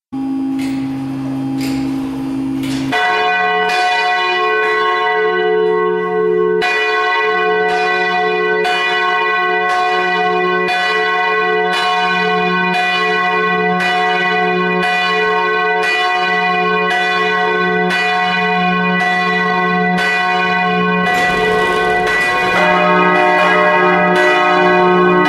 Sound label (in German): Glocken Sonntag; glocken jakobi kirche